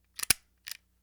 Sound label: crossbow fire; prosoundfx